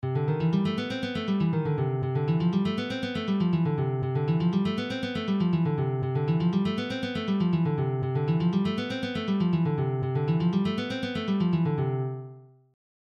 C Major Exersice

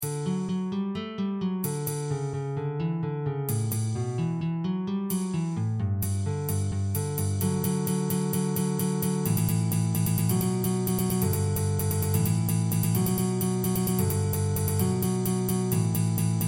Guitar

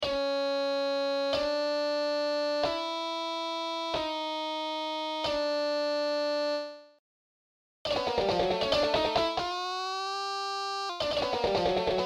HOTROD JAZZ, guitar; Andy Ellis november 1994

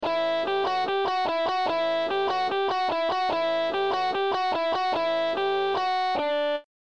solo

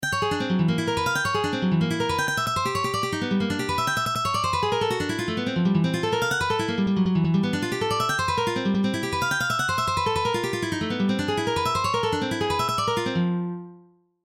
Hard exercise for sweeping

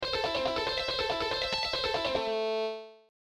Am Triad

